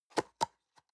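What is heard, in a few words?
box small close medium, Büroarbeit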